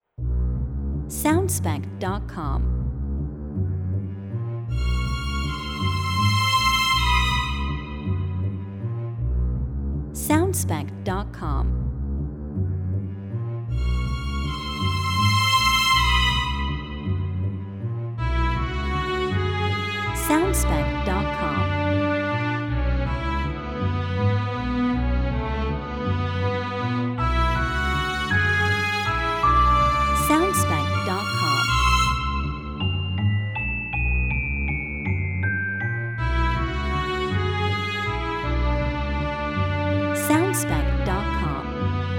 Little Suspense

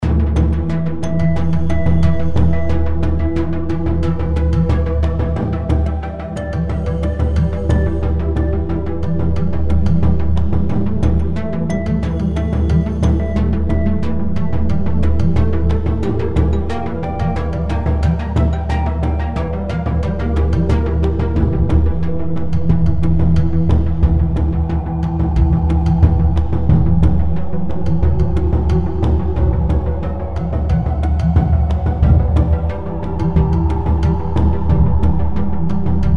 Urban Chase Short Loop

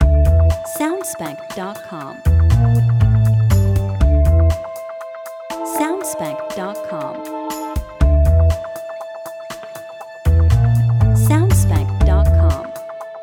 Spy Hard Short Loop

